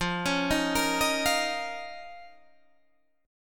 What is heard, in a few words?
F 7th Suspended 4th Sharp 5th F down Guitar Standard; F7sus4#5; arpeggiated; capo=2